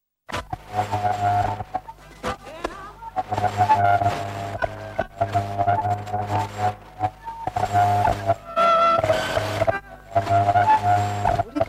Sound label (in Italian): Sintonizzazione radio